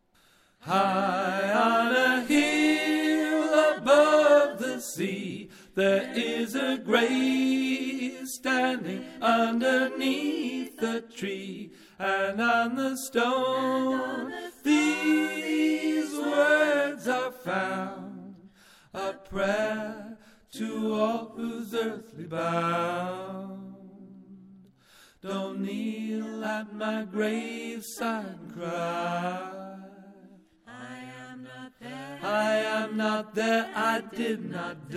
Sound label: tenor